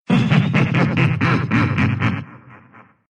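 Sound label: laugh, Russian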